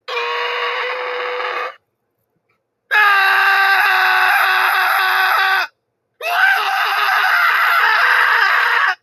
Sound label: screaming beans; runner